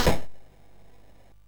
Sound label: sid MOS6581R3; Toobin